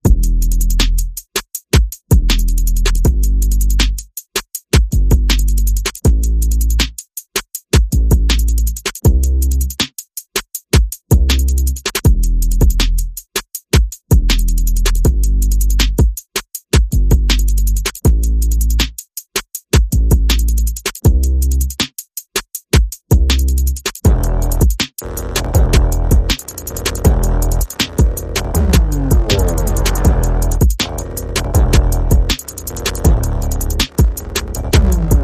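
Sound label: Bass and Percussion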